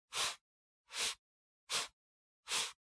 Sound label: PC Mouse Move Soft Pad Short